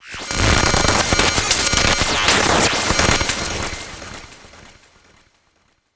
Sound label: Bender Fx